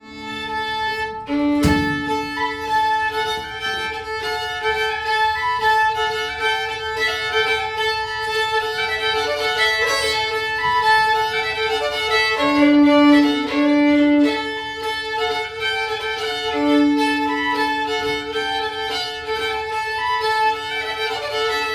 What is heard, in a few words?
Varmland Polska